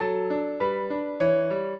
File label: trio, piano; Mozart